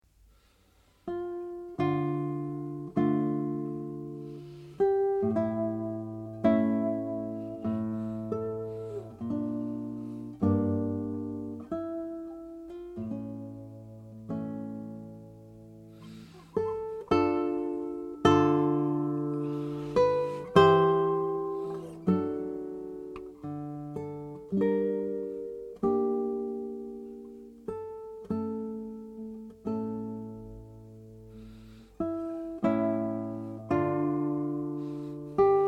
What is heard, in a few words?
sarabande, guitar